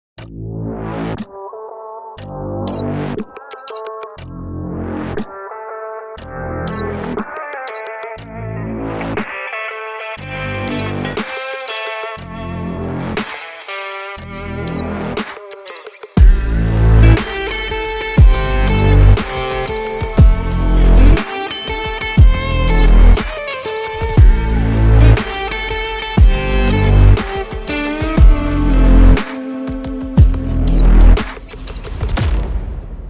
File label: Pole Dance, sexy rock